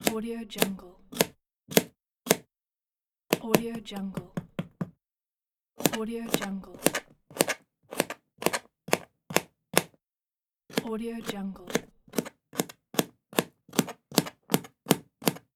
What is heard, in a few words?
cutting vegetables